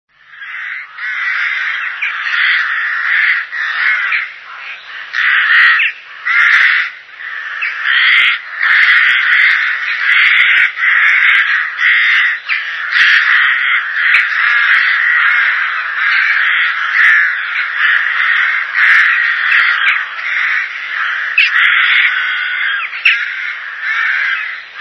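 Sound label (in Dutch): roek, rook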